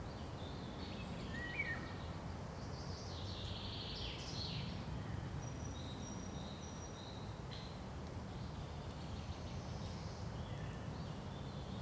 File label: silence sound